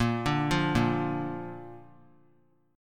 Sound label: Bb Major Bb down, chord; arpeggiated; JGuitar